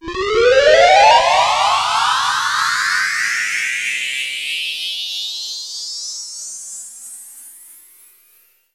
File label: FX SWEEP, SYNTHSWEEPS